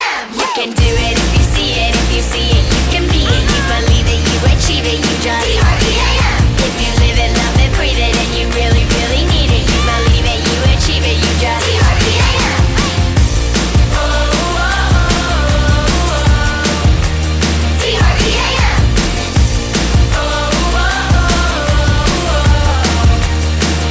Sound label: music ringtone